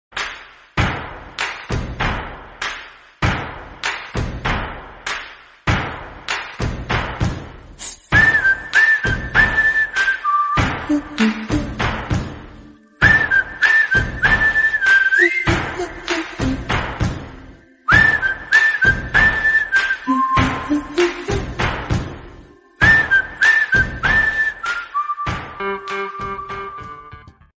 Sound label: friendship bgm ringtone background music bgm ringtone; nanban